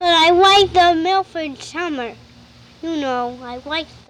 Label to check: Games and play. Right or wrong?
Right